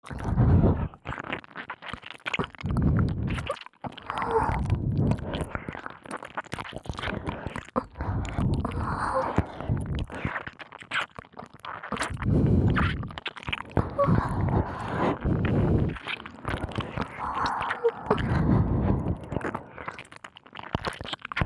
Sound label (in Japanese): (両耳)ねっとりしゃぶりつき耳舐めエッチ, KU100 くちゅ系効果音版